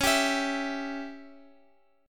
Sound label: Db Diminished Db Guitar Open F; strummed; x,x,x,8,10,11